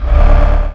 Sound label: Engine Shift Fwd